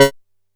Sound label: C#4, Bass; E-MU Producer Series Vol. 6 – Analogia Project; Boom da Bass